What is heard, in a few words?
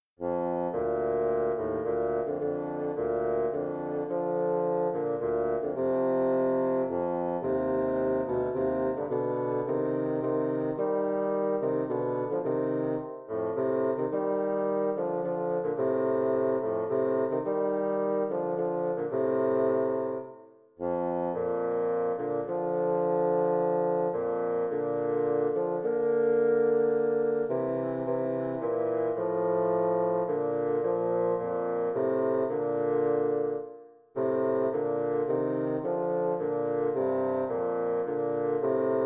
Intermediate Bassoon Duets in B Flat Major; JDW Sheet Music